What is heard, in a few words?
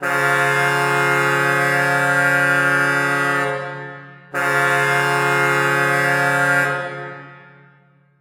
Hockey Goal, Hockey Horns & Buzzers; SFX Kit; StLouis